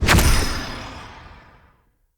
Staff Caster Cast Long Shot; Weapons; Player; Staves; Wazzard Staff Caster

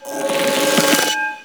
Choir Bow Charge; Warframe

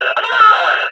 Enemy Pain; Warframe; Lasrian; Battle Barks

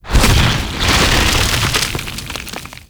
Landscape Heat Fissure Open; Gameplay; Warframe; Venus Open World

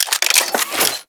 Arch Rocket Crossbow Idle Mech; Warframe; Archwing

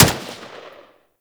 Grineer M16 Wraith Fire; Weapons

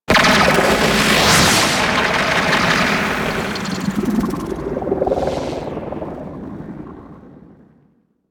SFX Transference Switch; Warframe; The War Within; Mountain Pass Return Intro; Cinematics

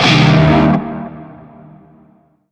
Shawzin Min Chord; Warframe; Narmer Shawzin

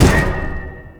Metal Debris Impact; Gameplay; Warframe; Tau

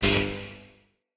Ambient Tesla Rod Zap; Encounters; Ode Pollution Tower

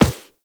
Operator Walk Metal, Footsteps; Warframe

